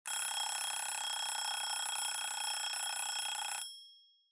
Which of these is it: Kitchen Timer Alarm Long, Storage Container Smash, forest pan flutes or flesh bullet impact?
Kitchen Timer Alarm Long